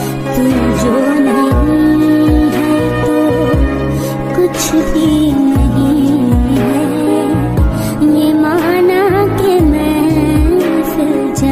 Old Hindi Song Ringtone

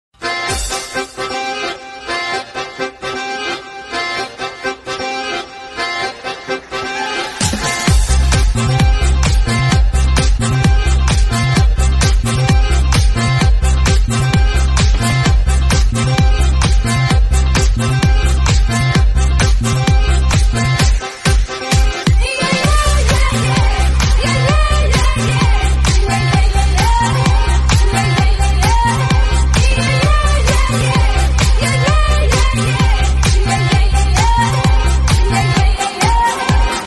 Group Remix Ringtone

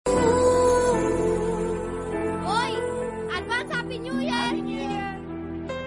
Happy New Year Ringtone

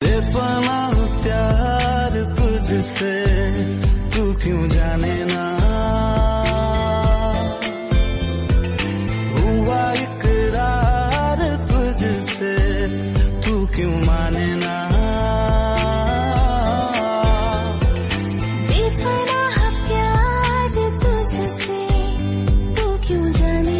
Hindi Song Ringtone

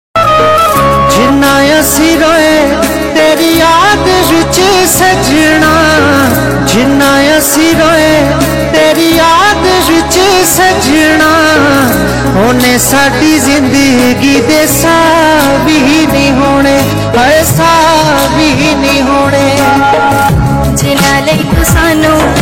Sad Punjabi Song Ringtone

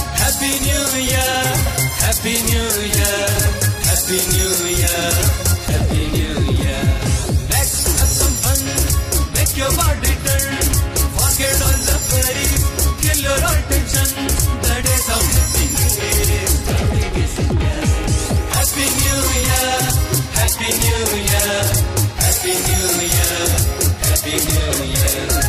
Happy New Year Ringtone

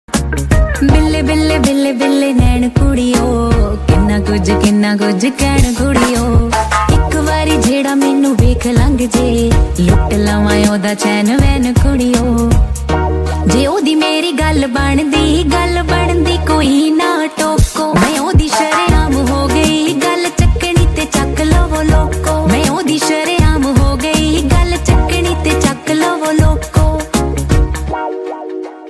Punjabi Song Ringtone

